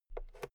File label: fader single slide click